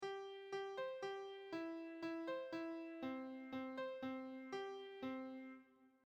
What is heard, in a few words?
Fanfare Breve